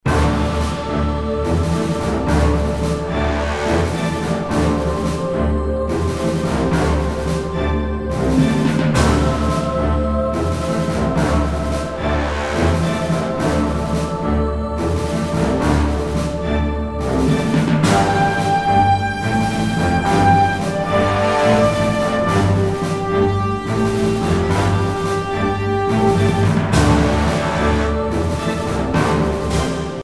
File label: Confident; bridgecommander